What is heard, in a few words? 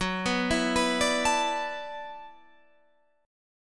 E 7th Suspended 2nd Suspended 4th, F sharp, chord